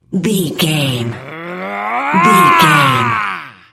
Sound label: voice male growl